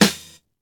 airy sizzle steel snare drum g sharp key

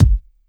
bassy steel kick drum f key; tuned drums f key

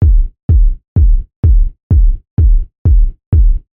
Techno Kick; drum loops

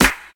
sparkle steel snare drum sound c key

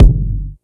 bassy kick sound f sharp key; kick drum; kick drum samples

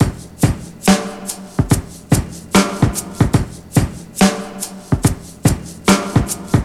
72 bpm breakbeat e key

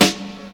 steel snare drum sound g key; snare drum samples

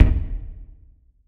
perfect kick drum d sharp key

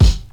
rap bass drum sound F# key; 1990s; kick drum samples

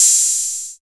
subtle open hi hat one shot a sharp key

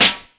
short snare drum sample f key

